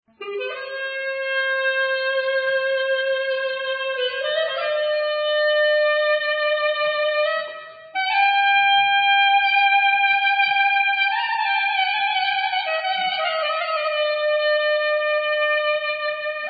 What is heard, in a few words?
Clarinet solo